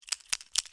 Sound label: Shaking A Spray Can; fesliyanstudios; subway surfers city sound effects